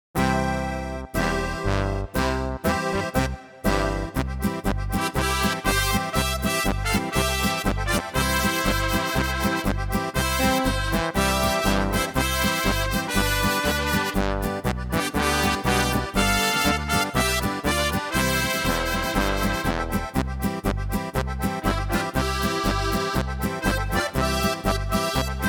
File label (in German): Oberkrainer Style